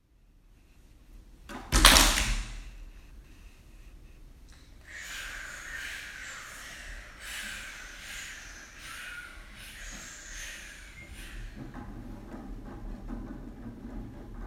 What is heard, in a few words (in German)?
Sommer Musik